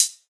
good hi hat sound B key, pedal hi-hat one shot tuned to the B note